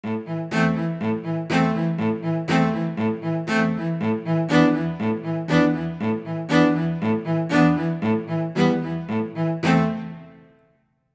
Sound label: cello combined; eastwest qlso